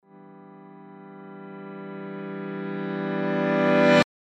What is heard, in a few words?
reverse keybord